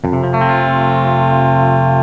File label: f major, scales